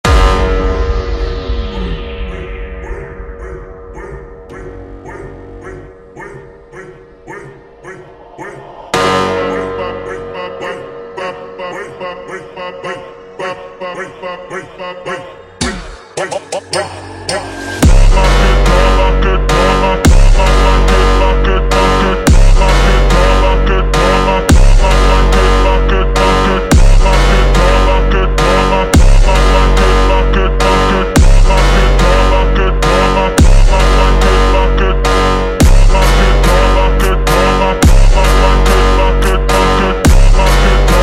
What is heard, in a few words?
slowed Reverb